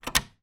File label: household window wooden frame old bolt lock